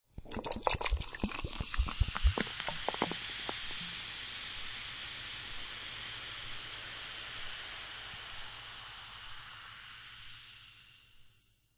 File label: Pour Soda Water Fizz Of Carbonated Drink Into Glass With Ice Cubes; Soda Gun